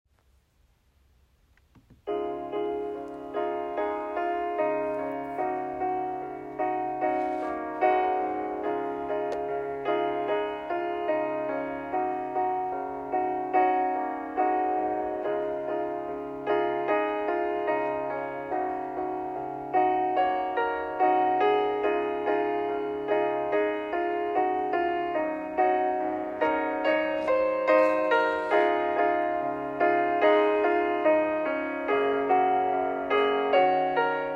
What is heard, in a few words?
Red River Valley Tenor Unchanged Accompaniment